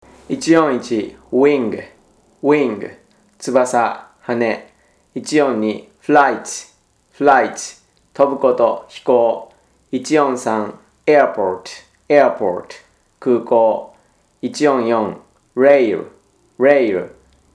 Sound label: tango